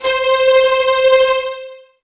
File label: Casio CTK-611 Tremolo Strings C5